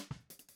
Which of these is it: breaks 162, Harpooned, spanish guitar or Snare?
Snare